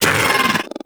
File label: Bug Vocal Pain; Characters; Warframe; Hunting Critters; InfKDriveBug